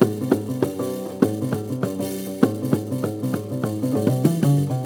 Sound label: DOOP LOOP, SINTIR; Spectrasonics; Heart of Africa 2